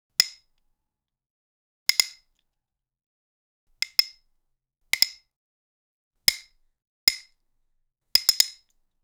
wooden hockey stick snapp

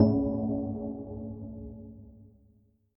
soft energetic gong hit

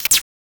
videogame bat attack

